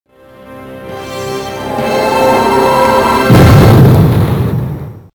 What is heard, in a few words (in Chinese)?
电视包装音乐